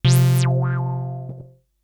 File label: SYNTH